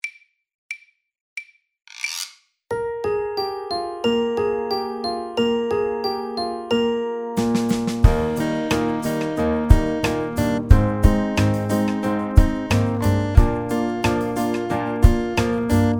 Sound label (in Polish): wersja instrumentalna